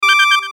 CHIME SYNTH, Chime Synth High Delay; Chime Synth High Delay Production Element Imaging Element Accent Transition; Multimedia; Blastwave

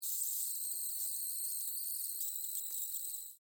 Insect Voice Pain, Vocalization, Bug; Animal; BlastWave

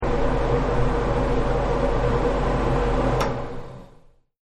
Fan Turn Off; Fans; Electronics

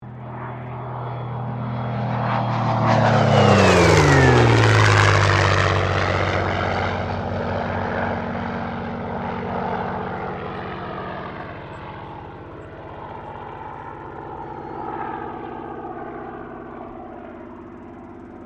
AIRCRAFT SUPERMARINE SPITFIRE MK-XVI PASS

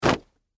Jeans Drop, Foley, Clothes, On, Ground; Dressing Sound Effects; BlastWave

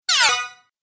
Falling Video Game

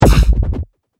Laser Percussion Shot, Laser Gun

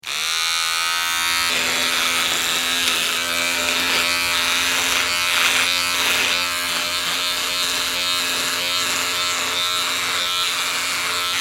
Razor Electric, Trimming beard; Miscellaneous Electronic; Tom Hutchings

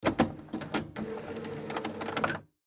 Printer Lexmark Reset Cartridge; Office; Electronics; Blastwave

